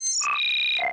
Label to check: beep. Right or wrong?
Right